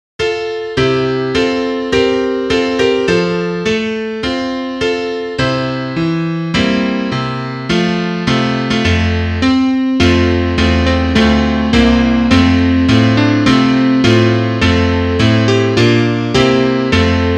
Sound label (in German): Klavier; Liederbuch; das wandern ist des muellers lust